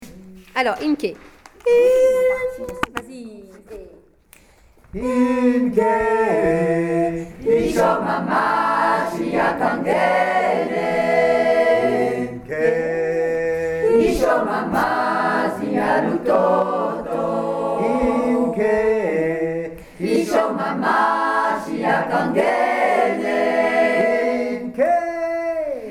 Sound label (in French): Kukhona 2eme partie 3 voix